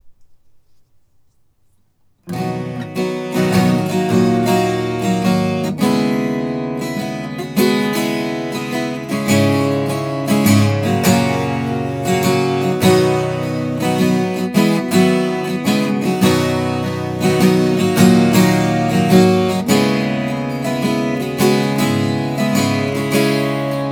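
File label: strum 12 right, hsguitar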